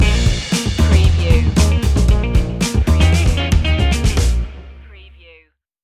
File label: funky jazzy flow stinger